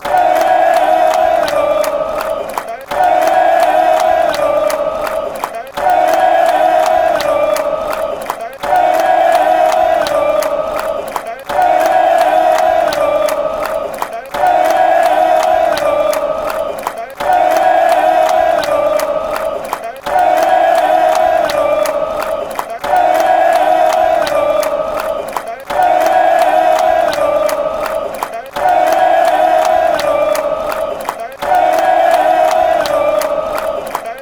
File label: hey oh fan chants; flamengo